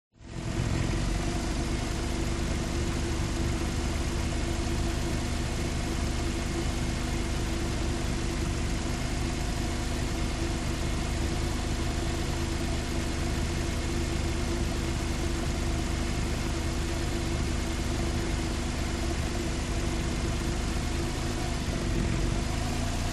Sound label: TRANSPORTATION SPORTS CAR Ext Engine Idle Unstable